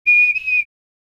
Cartoon whistle short get attention

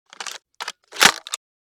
Assault rifle foley manual reload mechanism

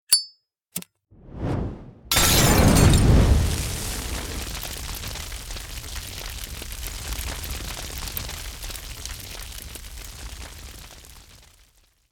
Molotov throw glass break and fire; war

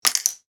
Dart falling to floor surface impact; Darts

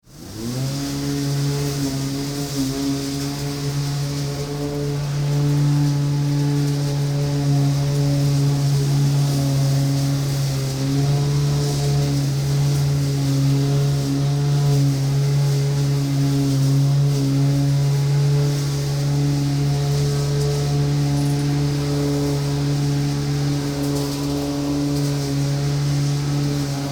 Electric grass trimmer engine noise

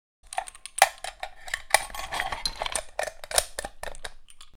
Sniper rifle brass shell foley movement

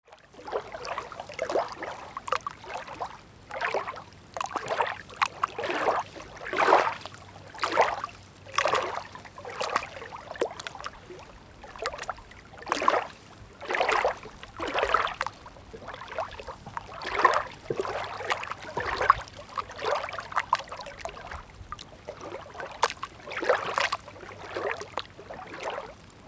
Beach ambience waves quiet close perspective

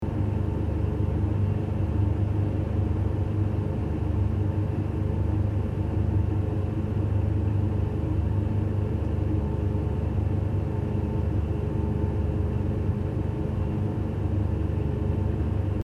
Car medium speed driving interior loopable

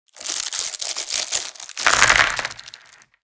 board game pieces dropping into board; Monopoly

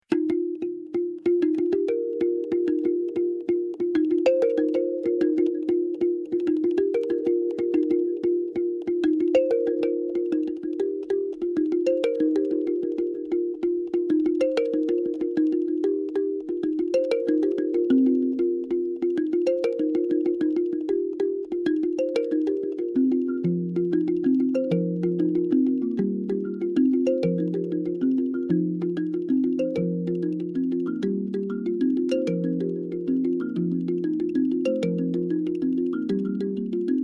Tribal beat

